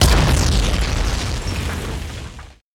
Explosion

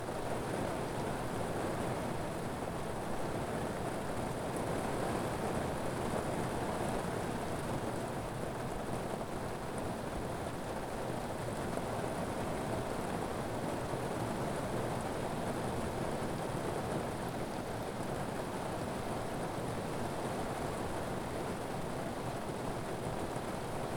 Hard Rain On Car Roof

